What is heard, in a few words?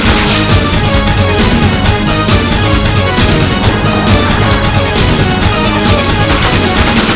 bar music